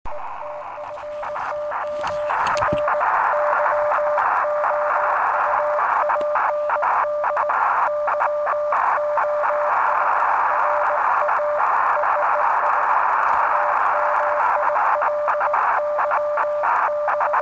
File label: mag LOOP 60sm